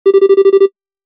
Office Pulse Lo, Business Rings

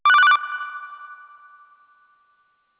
Codec Alert Tone

